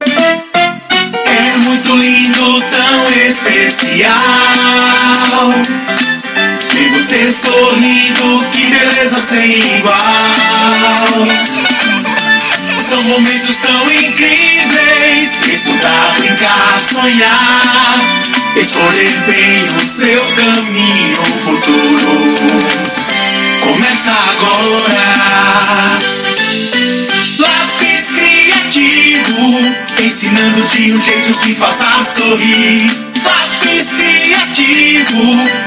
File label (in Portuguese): Infantil, jingles comerciais; Escola Lapis Criativo